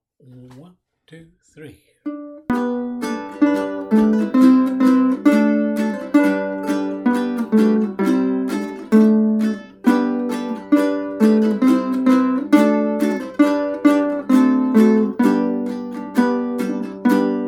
Uke 3 Practice; Bransle Des Chevaux

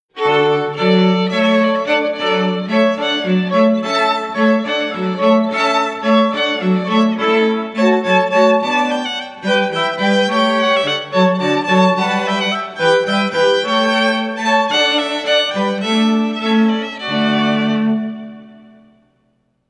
hornpipe

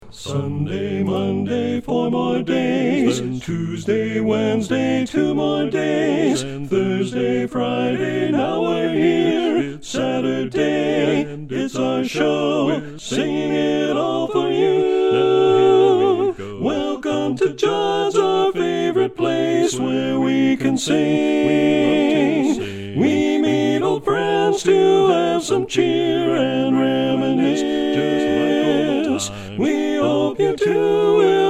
lead predominate

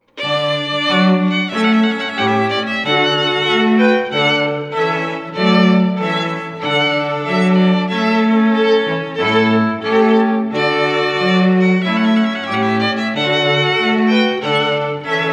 trumpet

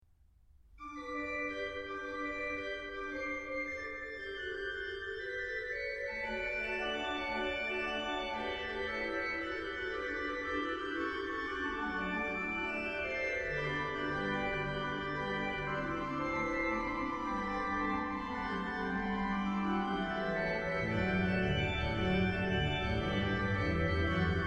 Gigue

